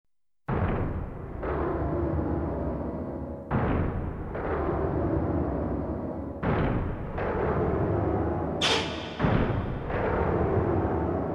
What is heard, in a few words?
Scary Sounds Psycho; ringtones